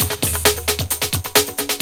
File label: BREAKER, DIRTY DR. LPS